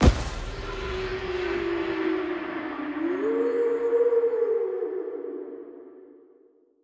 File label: Pumpkin Ghost; The Inn Pumpkin 2D; Devour 2021 gamerip pc macos